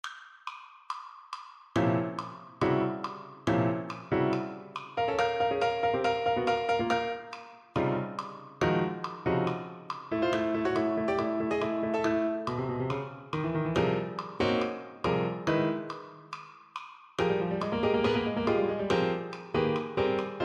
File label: Tuba sonata, clic; Plog mvt 2